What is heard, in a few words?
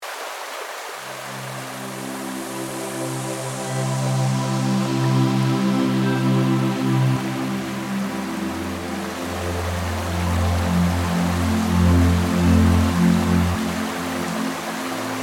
Meditation Stream